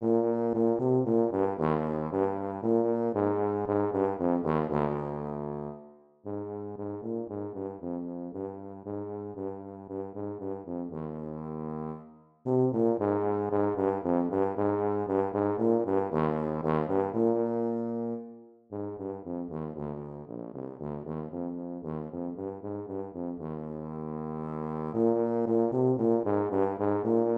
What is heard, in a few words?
Tuba